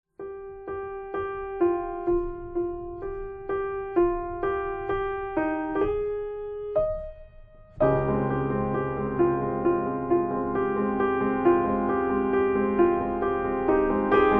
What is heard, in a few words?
Piano cover; ANIMAL CROSSING： New Leaf